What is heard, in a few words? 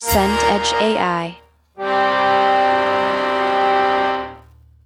brass fall low